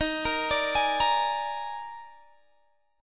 D sharp Major 7th Suspended 4th, D sharp, down, Guitar, Half Step Down